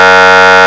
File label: horn, sirens; federal sig tm